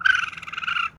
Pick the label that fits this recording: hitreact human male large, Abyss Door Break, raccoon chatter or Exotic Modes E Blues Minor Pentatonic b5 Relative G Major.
raccoon chatter